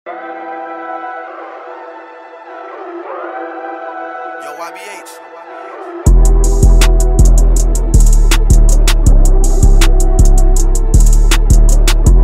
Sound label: instrumental